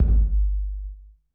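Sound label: wet room kick 808; Masterbits - Soniq Elements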